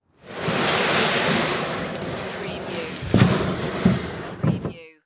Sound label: Boat Canoe Push; sound-effects-bible